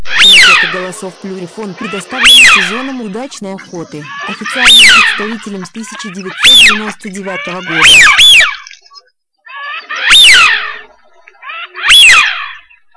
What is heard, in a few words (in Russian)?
Свиязь